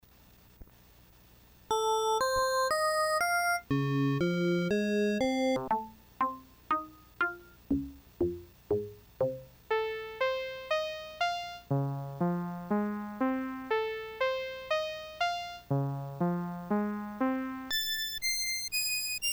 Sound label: iYM2151 patches